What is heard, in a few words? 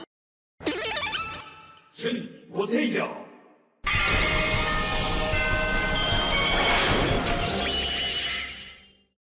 henshin sound; tensou sentai goseiger